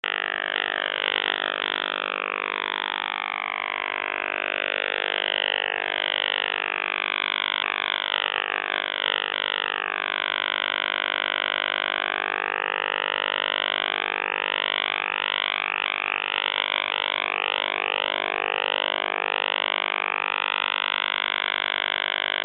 50swps

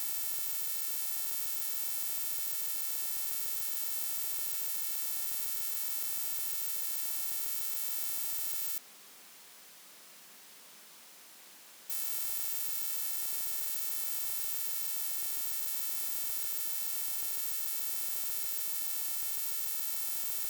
30952kHz AF; HDSDR

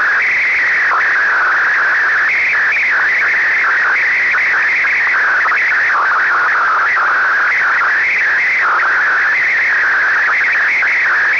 Weird Again on 2883

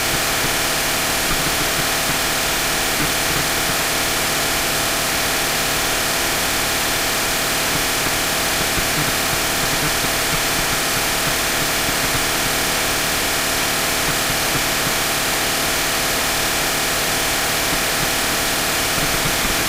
FLASH-OFDM sound

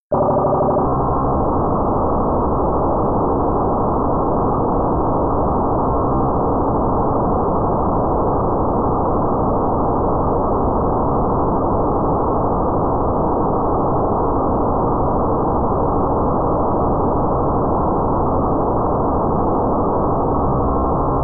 DPMR

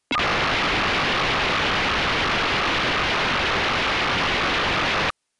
P25 WIDE VC IF

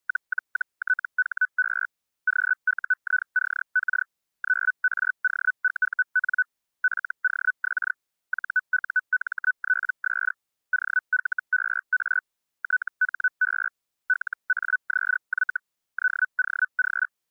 FeldHell

